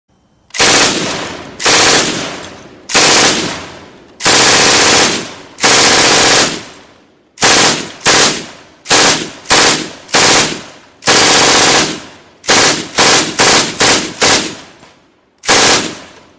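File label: zvuki pulemeta, machine gun sounds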